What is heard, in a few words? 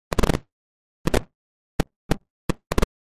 Static Distorted Hit